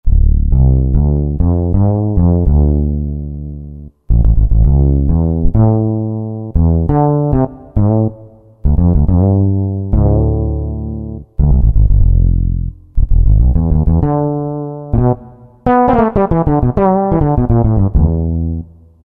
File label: Fretless Bass, Roland JD-990; Factory Patches